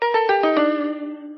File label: asian sting